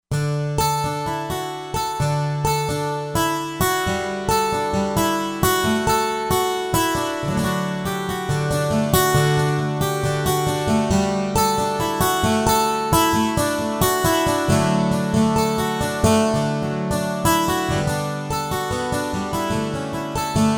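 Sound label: Guitar 18 String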